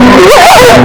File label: screaming; oddbods bubbles